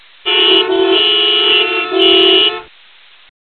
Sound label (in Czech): automobilova zacpa